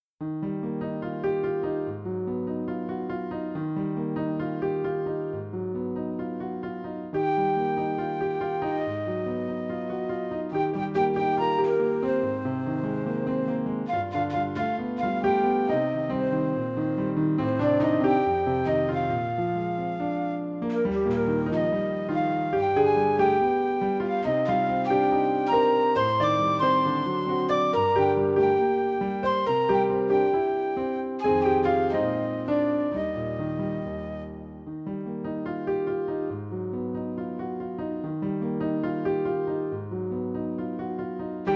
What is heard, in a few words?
Blue Whale backing